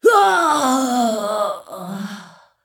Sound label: Death